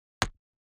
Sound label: BOW hits